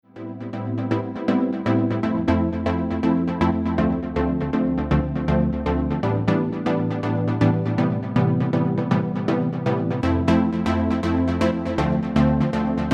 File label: Synth Prog House Pluck